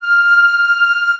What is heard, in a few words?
flute